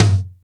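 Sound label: Acoustic low tom, Roland MC-303 Toms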